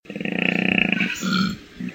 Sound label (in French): Koala